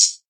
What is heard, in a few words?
dampened hi hat one shot f key, Royality free pedal hi-hat sound tuned to the F note